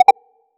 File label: ui sound effect for click; button click